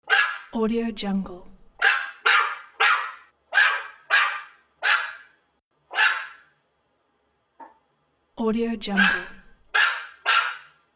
dog barking; bekaproduction

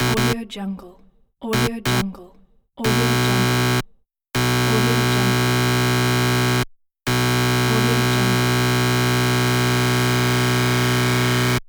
wrong answer buzz; fx-library

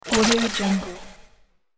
scifi space sound

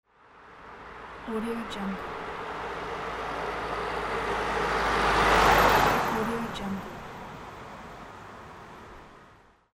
car drive by

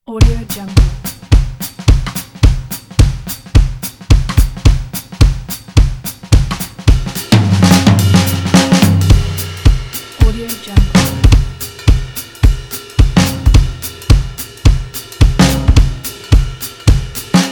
jazz drums intro; garagesound

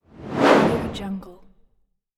whoosh; adrianbarrie

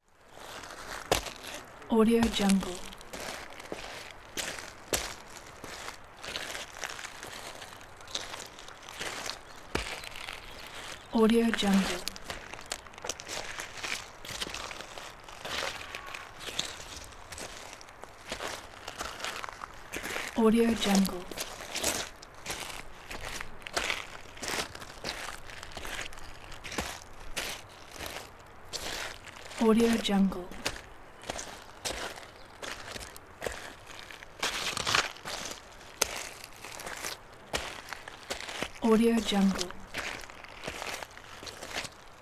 footsteps on pebbles

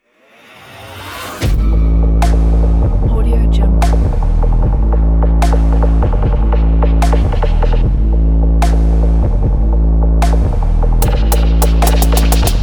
agressive sport trap

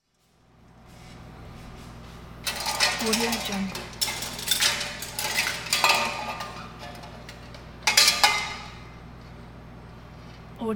commercial kitchen clanging pots utensils

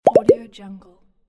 plop button; kazoomx

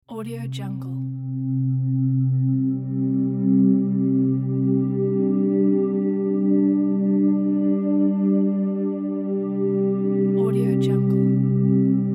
ambient waves

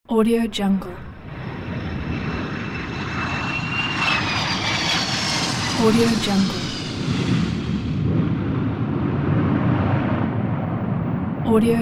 airplane jet landing

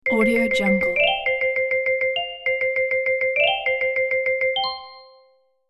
super marimba loading

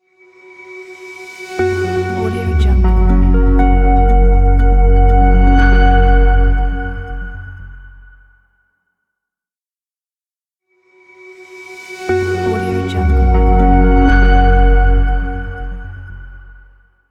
ambient opener intro reveal ident

